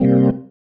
Stab One Shots, Organ

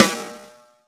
snare rim; E-MU Formula 4000 Series Vol. 2; OrbitPercMaster

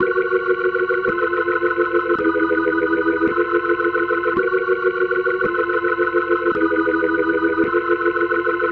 Psychedelic, Organ, Loops

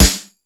Hip House, Drum Machines E-MU Pro-Cussion

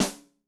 Old Can, Yamaha DTXpress-II snare

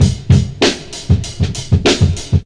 house loop

